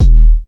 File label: Da Power Kick, Ensoniq ASR-X Pro